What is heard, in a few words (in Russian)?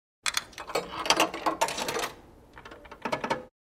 phone